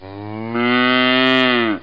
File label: animal